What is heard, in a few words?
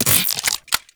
Idle, Weapons, Sentient; Warframe; Shedu